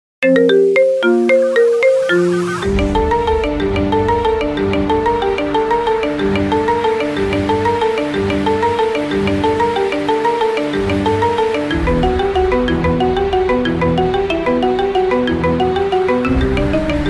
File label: marimba; The Walking Dead